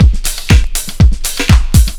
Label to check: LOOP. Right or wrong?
Right